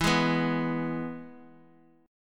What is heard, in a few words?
E Major E down